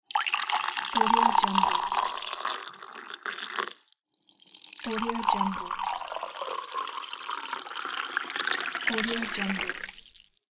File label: pouring tea and coffee